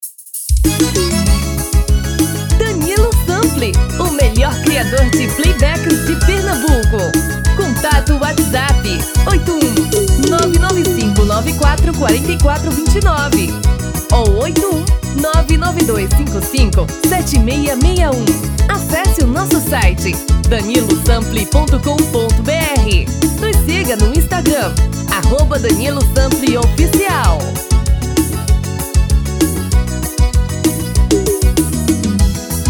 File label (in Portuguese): Forro das Antigas